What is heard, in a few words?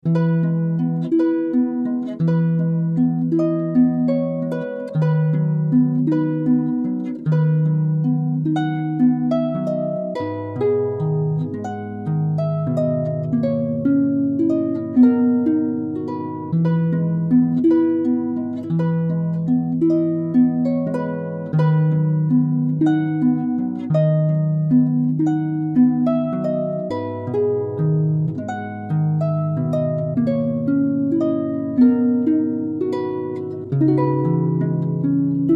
Harp